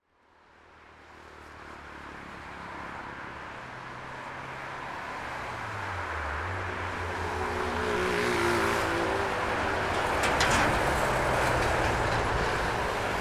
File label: Highway Traffic Heavy Traffic